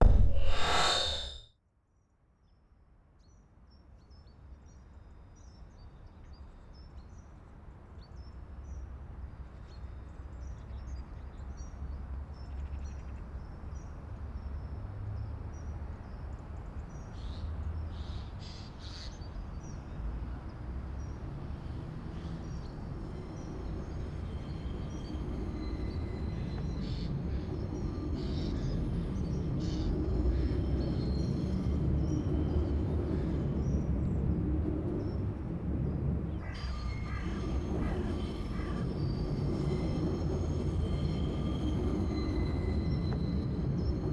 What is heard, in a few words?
Soundscape, At the railway bridge over the towvliet (haagvliet); Location: At the railway bridge over the towvliet (haagvliet); Sietse Soundscape – The neighborhood as a concert hall; Composer: Sietse